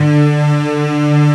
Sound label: STRINGS D1; E-MU Formula 4000 Series Vol. 3; Analog Odyssey; Synth Builder